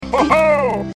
ho ho; freak fortress 2; king harkinian

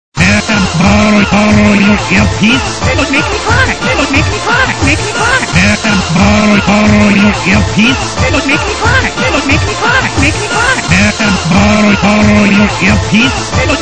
cry; freak fortress 2

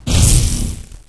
zoom out; predator

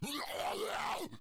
attack, slender shiver

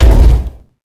step, baron of hell; doom4

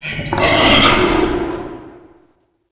wretch tunnels amb alert; A Machine for Pigs

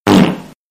fart

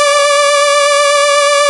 ufo beam

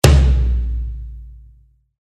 drum

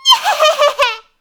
laugh evil; suika; demoman

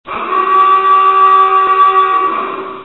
klaxon; hl2dm

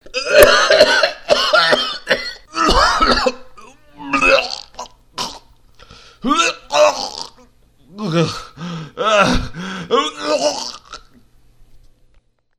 vomit

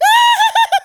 halloween scream

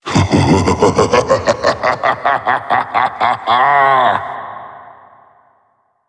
laugh; slender; meph

